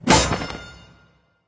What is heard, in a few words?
Banner-Impact; Hero Junpai